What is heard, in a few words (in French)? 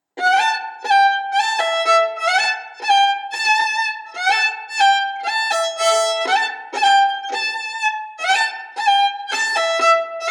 Bourree; Recueil Hainl; Bourree de Cusset